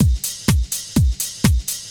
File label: drm, drum loops, smooth track; house; PS-28 Vocal House Samples